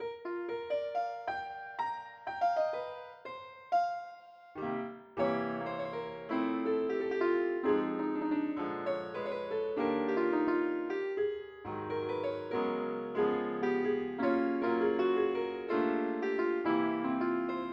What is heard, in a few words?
piano